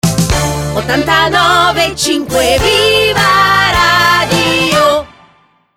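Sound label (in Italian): jingle viva radio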